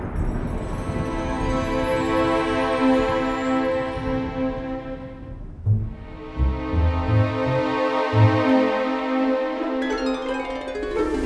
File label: Cinematic Intro